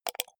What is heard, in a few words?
computer mouse wheel click fast